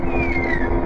beluga; DWN WHIS

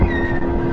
whine, beluga

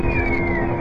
whistle, beluga

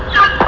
chirp, beluga

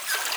CHIRP, beluga

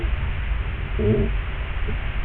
snort, elephant

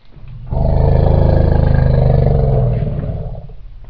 greeting, elephant

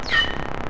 chirp, beluga

